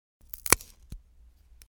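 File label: ramen disposable chopsticks; waribashi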